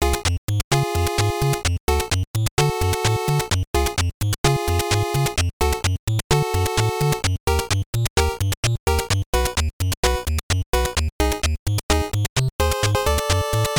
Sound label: Ominous Dungeon; Adventure Island 2; NES